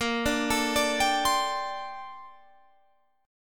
Bb Major 13th Bb; arpeggiated

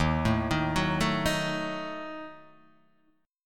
D sharp Diminished D sharp, Guitar, Open D; chordshape; 1,0,1,0,0,1

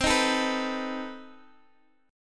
B 7th C; B7/C; strummed

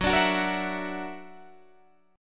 Gb Augmented 7th Gb down Guitar Open D

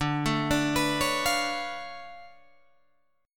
D Minor Major 13th, down, Guitar, DADGAD

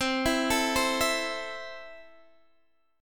C 6th, C, down, Guitar, Standard; arpeggiated; chord; JGuitar

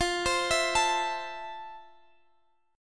F Major 7th Guitar Open F; FM7; x,x,17,19,19,16; arpeggiated

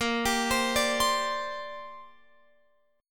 Bb 6th Add 9th Bb Guitar Open F; Bb6add9 arpeggiated

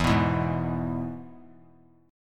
E-Major E; strummed; JGuitar

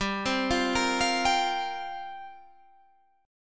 C 7th Suspended 4th G

